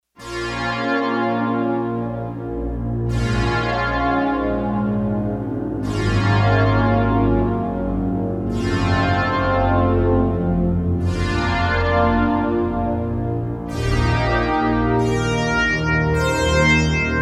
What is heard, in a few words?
48 Slow Strngs, synth